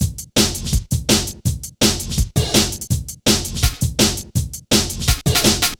Hard Stepz, Jungle, Drum Loops; 165.5; Zero-G Jungle Warfare 1, 2 & 3; 90 s Sample CDs

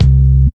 combi drums

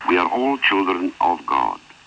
Voice Children

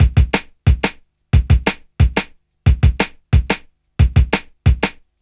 Kick Snare Loop

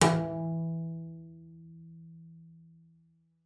E3, bass pizz; Xsample VOL-5

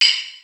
DR-660 FX; Rubber Chicken Software Sample Bank

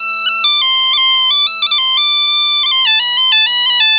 EM BP, Celtic Flavours, BAGPIP090; USB Soundscan vol.42; AKAI

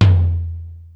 Floor Tom, Yamaha DD-35

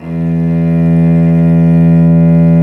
STR VC Arco Solo p

